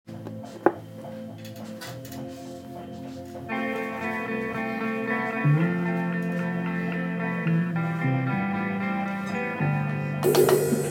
4/4 rock beat live

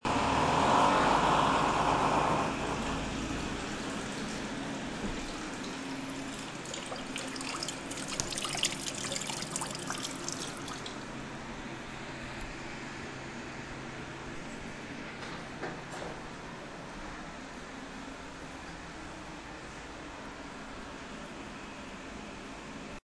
sounds in my kitchen

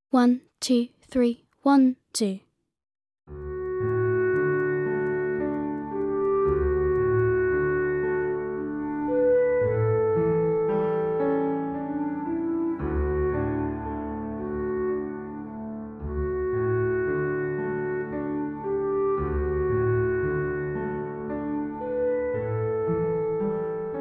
Lullaby backing track

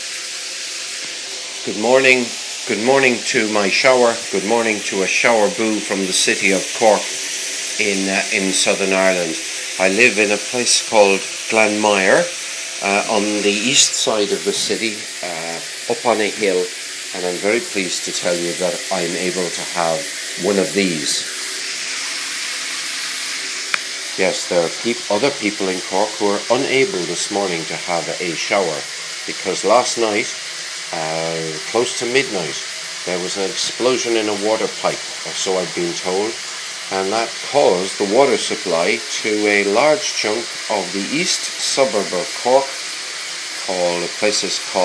water explosion; in cork

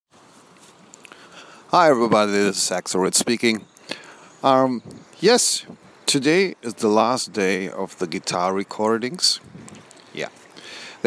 guitar recordings

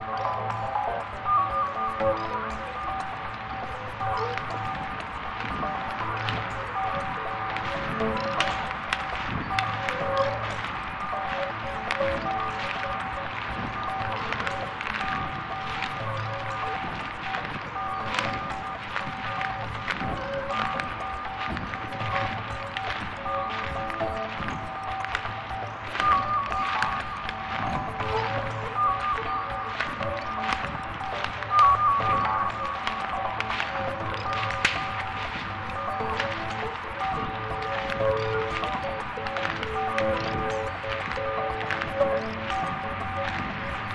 rainy forest electronica remix

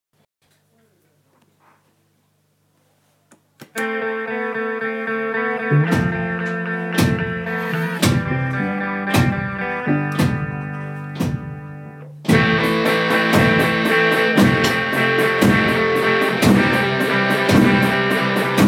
4 4 drums live

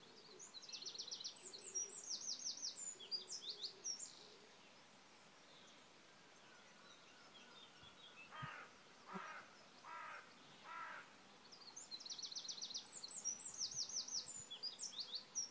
country sounds in Slapton

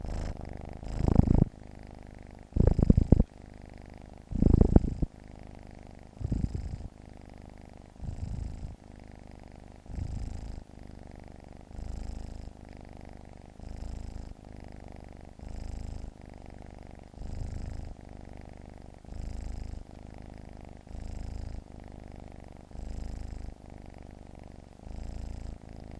purr

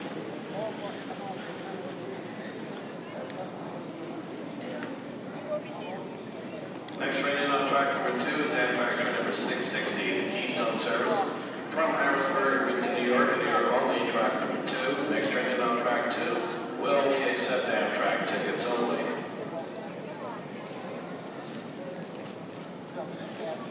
newark penn station new jersey ambient sounds

